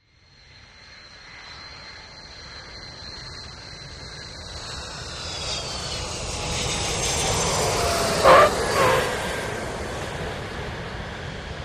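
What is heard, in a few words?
Large Jet Landing Tire, jets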